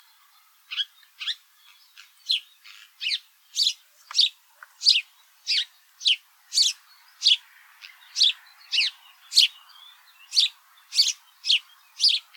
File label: Serçe Passer domesticus